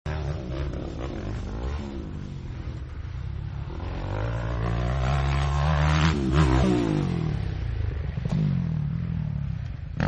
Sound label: Moto X Jump, motorcycle; Vehicles; Partners In Rhyme